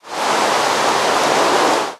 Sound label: rain, ambient weather